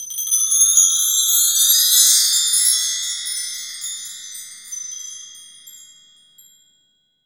mark tree, wind chime

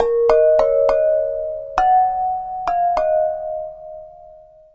Flower Pot

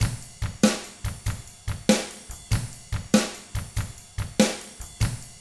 rock 6/8, drums; ILIO; Double Platinum Drums 1

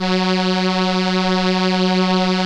ARP STR ENSL F#2, First Call Vintage Keyboards

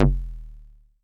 bass stabs, Drum Machines FutureRetro FR777

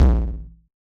Yamaha DJX 2B, Hard Tom

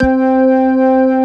Somber Organ; Mo' Phatt; Digital Sound Factory Mo' Phatt

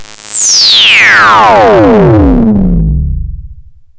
OSC FX, OSCILLATOR FX; Zero-G Ambient 1

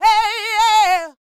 D YEAH, Vocal R&B Dance; DB DANCE

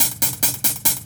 HH LOOP, 140 BPM; Ueberschall; Drum & Bass Resonance

